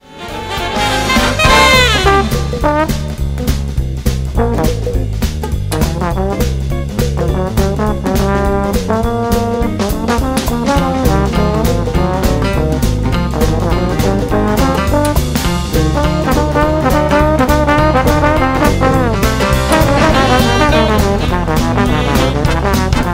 bone solo

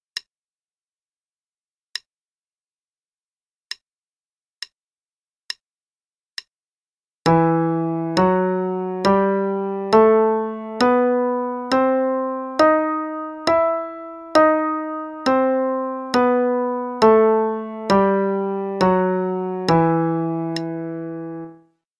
e harmonic minor Scale (qn=69); CM Level 2

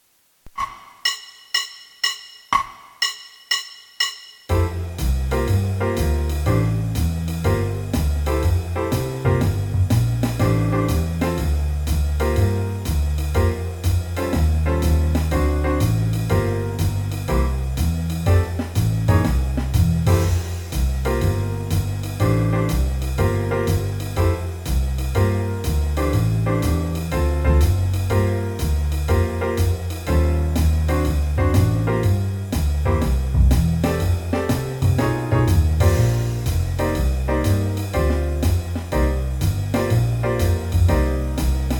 Play-along, qn=122; iReal Pro; trumpet

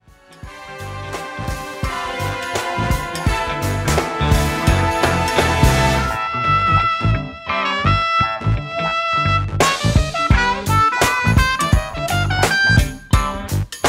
trpt solo only, jazz trumpet

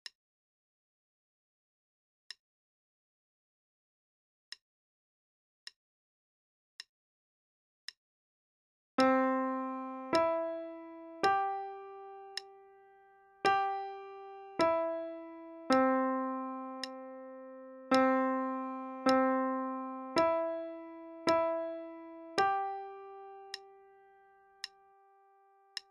Warm-up with 3rds (qn=55); Adult Level 1

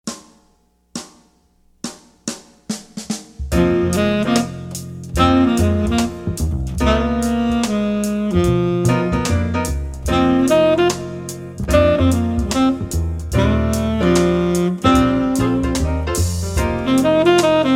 Blues, Trumpet, jazz; 15 Easy Jazz Etudes; CM Level 2 Solos

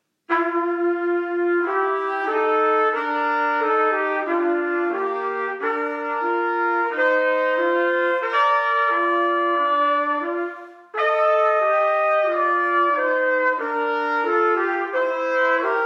Serenade, both parts, qn=90, trumpet; Rubank Int

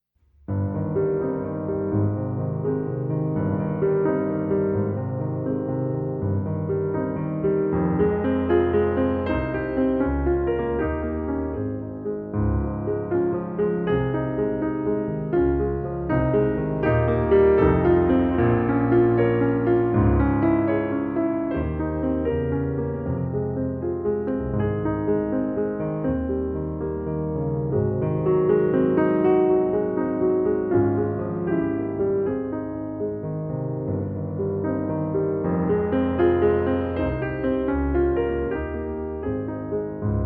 Accomp; classical trumpet

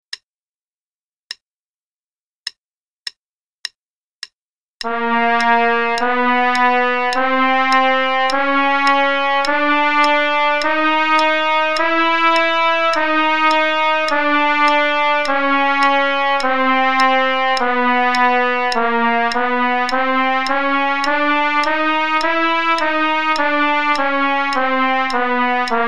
Play-a-long, Chromatics, qn=104; trumpet

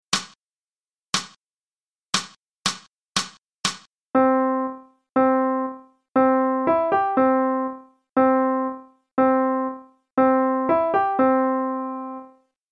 Transcribing Rhythms; non-swinging

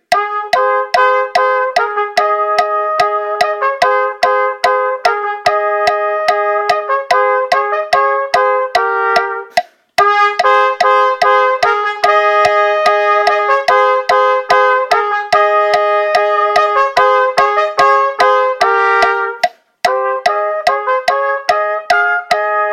Easy Duets, both parts, qn=146, trumpet

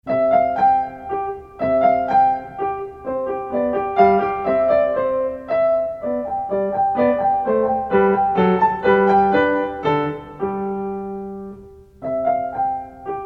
Minuet in C major; Marlais Book 3

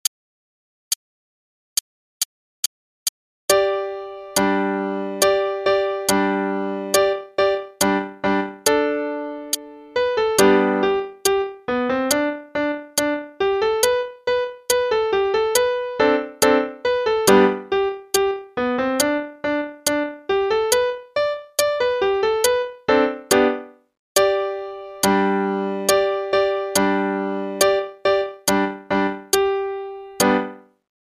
American Fiddle Tune (qn=140); Faber Adult All-in-One; Adult Book 1 (All-in-One)

